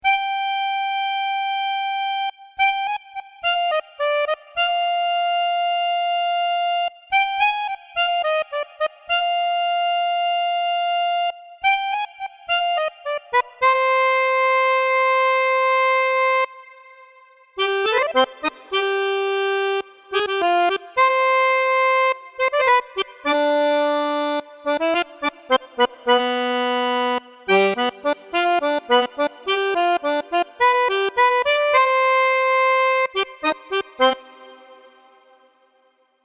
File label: Tango Solo, tango accordion